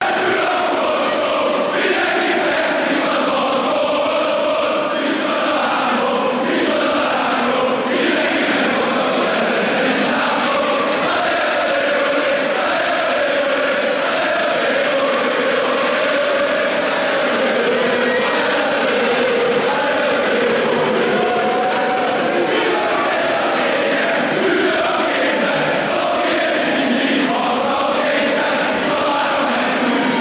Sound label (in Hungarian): ures a hordo, hungary chants